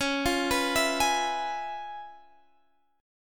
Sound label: Db 7th Sharp 9th Db down Guitar Standard; Db7#9 arpeggiated; JGuitar